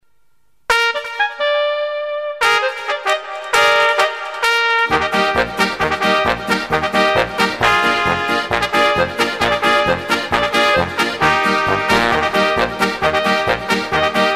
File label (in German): Trompeten Echo